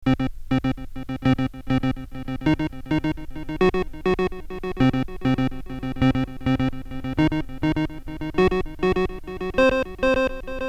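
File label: Ping Pong delay Orgel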